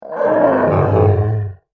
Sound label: Dinosaur Groan, Creature